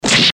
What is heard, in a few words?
eastern fist hit seq